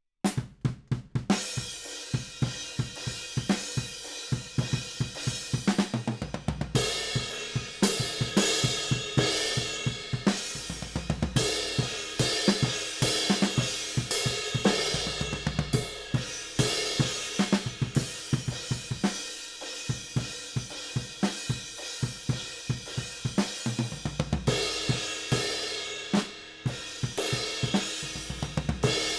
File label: Ride